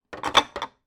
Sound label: household glass jar put in fridge door